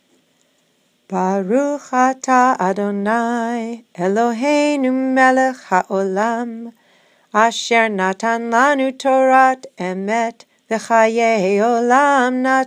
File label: Torah Blessing Up Tempo